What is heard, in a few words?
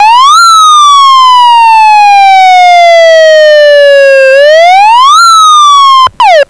wail